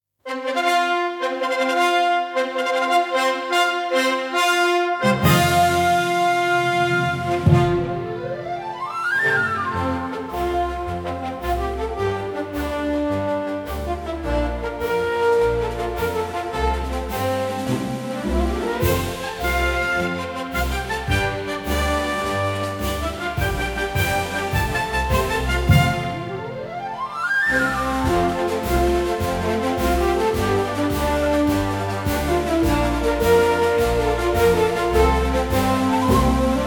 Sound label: brass orchetra